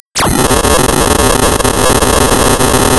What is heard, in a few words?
Sound of tearing paper, center